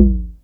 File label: SYNCUSSION